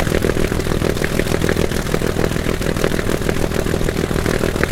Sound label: me109 side, engines; War Thunder